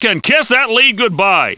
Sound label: KISS